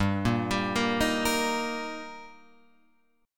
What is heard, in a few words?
G Minor Sharp 5th G Guitar Open F, chord; arpeggiated; JGuitar